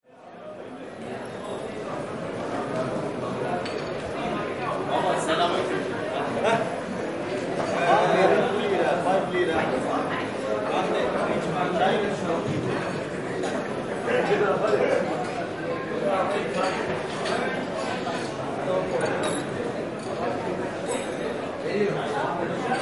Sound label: Grand Bazaar Indoor